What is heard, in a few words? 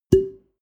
bottle whisky cork pull out loud; gastronomie; berufe